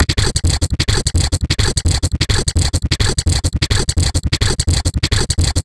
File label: glitch loop for artcore